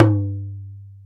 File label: Talking Drum Tone; Multisamples; Digital Sound Factory Planet Earth